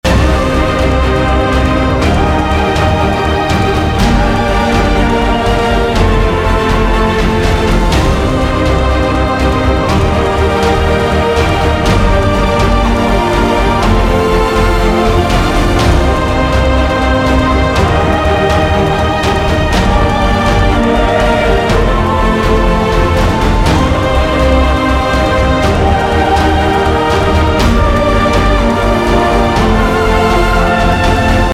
Loop Action

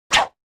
Cast; Monster Squad

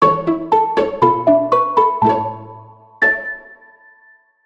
PIZZ; Oto Puri Koiseyo Otome Oujisama Dokidoki Wedding Bell Original Soundtrack

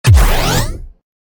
Parry

